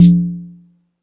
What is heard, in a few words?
Jungel Percussion, E-MU Pro-Cussion; drum machine